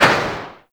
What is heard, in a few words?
SMG Tail; Weapons; Warframe; Tenno; Sundial